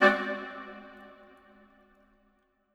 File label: Woods High Hit